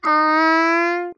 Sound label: Baby Sax